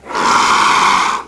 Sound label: snake die; DAoC